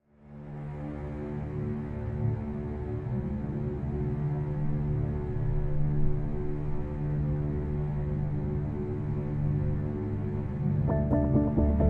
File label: Submerged Drone